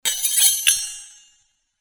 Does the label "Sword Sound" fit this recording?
Yes